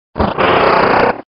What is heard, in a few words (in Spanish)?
Grito de Sharpedo